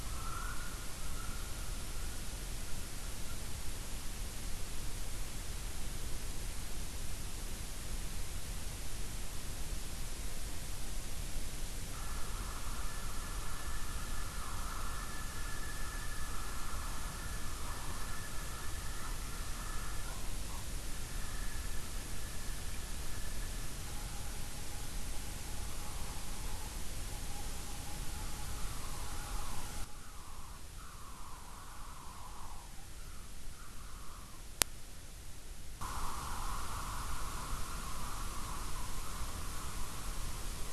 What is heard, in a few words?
WFR audio emfisis